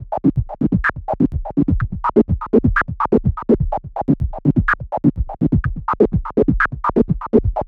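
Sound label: Synth Loop, 125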